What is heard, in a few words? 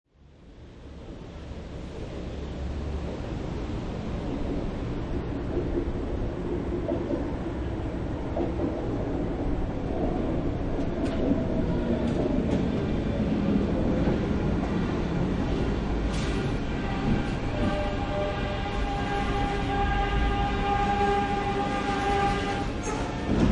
Subway Detroit People Mover Pulling Into Station, Subway train / underground train; pull into station and stop